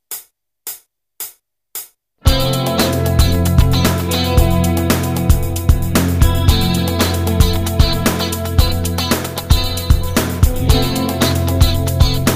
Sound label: jam, guitar